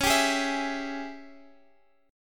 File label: Ab Major 7th Suspended 4th Sharp 5th Db